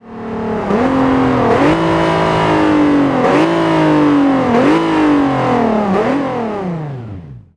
throttle off, vehicles; gmod; tdmcars; golf r32

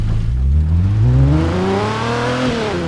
first, vehicles; chevrolet impala

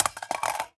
phys mag drop ar poly concrete, magazine drops

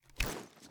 reload empty start; gmod; wfoly plr sh charlie725

